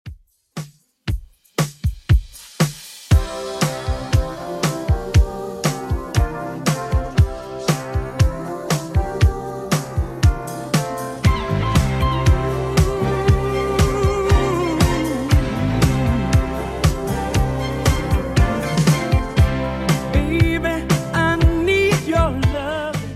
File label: 70s Redrum 118bpm